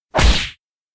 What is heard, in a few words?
self punch; anemoi